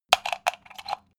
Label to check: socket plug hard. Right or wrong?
Right